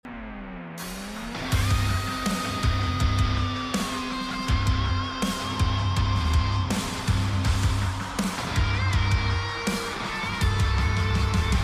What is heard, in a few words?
Battle Dungeon